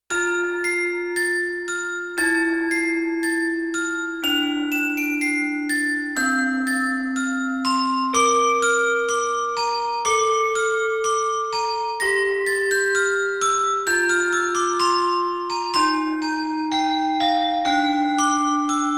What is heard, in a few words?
Handbell, Yamaha TX16W; Wind Chime & Handbell